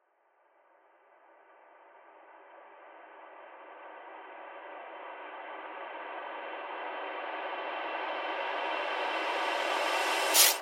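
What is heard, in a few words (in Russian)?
вжух в пространстве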